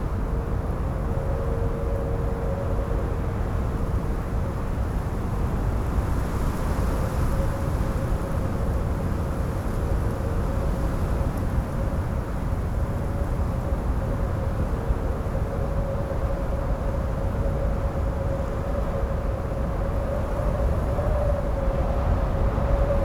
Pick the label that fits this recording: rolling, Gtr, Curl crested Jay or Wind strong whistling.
Wind strong whistling